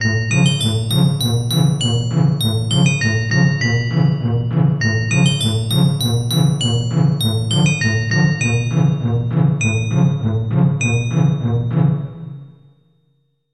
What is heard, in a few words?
Tinkle; Rabbits